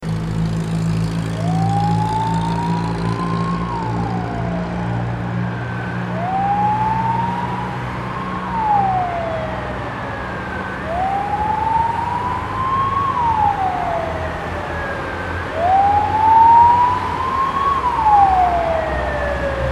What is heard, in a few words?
fire truck siren